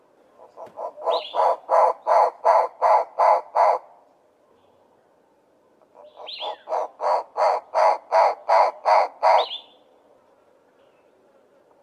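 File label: Knysna turaco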